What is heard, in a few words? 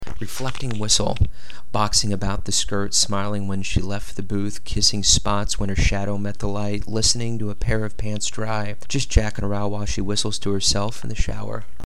whistle